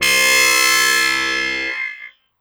SFX, Drum & Bass, 160 BPM; Ueberschall; Drum & Bass Flash Trackz

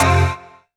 HOUSE, House Dance

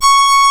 C#, strings; Big Fish Audio

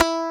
MUTE GT E4

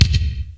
KICK, DRUMS; Crypton - DrumBase5000; CD DRUMS #1

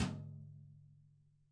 DW Tom Brush; EMU PX-7

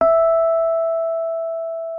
E5, RHODES; Xsample VOL-1

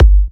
G, Kicks; markus hakala

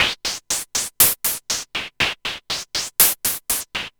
Gated Seq Noise, 120BPM

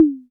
Percs, Elektron MachineDrum SPS1 MKII

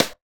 SD, Dance Mega Drums; Best Service; SD-HIP

